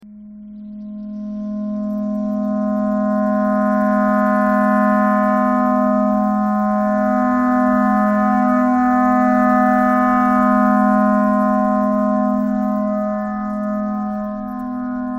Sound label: clarinet thread noise